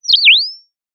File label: Sound of a bird tweeting nicely